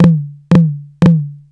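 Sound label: hi tom flam, saturated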